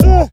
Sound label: fx kick, ragga dub reggae; USB Soundscan vol.27; AKAI